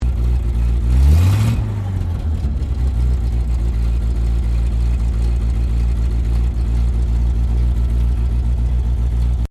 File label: APC FV603 Saracen Idle Rev, British Saracen Light armoured vehicle; Trucks; Vehicles; SoundEffects-Foundation1